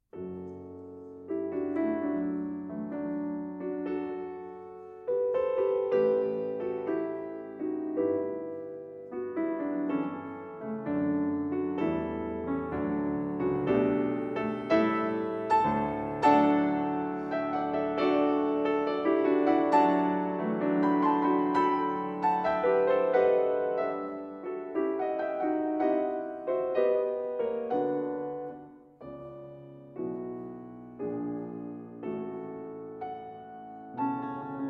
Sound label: Divertimento For Piano II. Andante